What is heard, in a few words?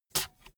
bmx bike tire foot scuff medium